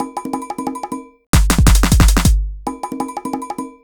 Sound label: Groove Pizza 180bpm